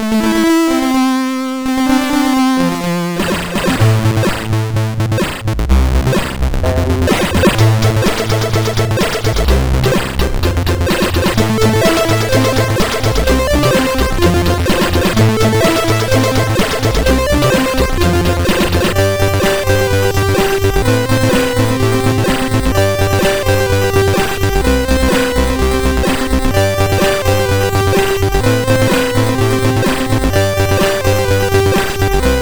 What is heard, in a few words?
MOS6581R4, sid; Pride